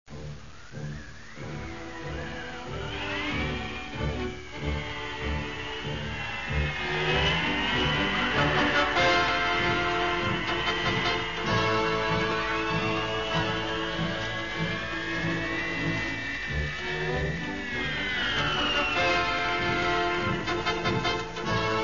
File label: march torches